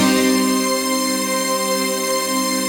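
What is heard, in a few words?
chord pad, Pads; Best Service; XX Large - Pads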